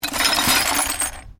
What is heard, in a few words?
Glass shards movement; Material components for constructing more complex sounds; Glass (Sound Effects:Materials)